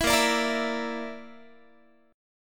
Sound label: Bb Major Flat 5th, Guitar; BbMb5 strummed